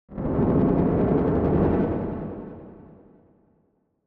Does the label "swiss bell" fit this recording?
No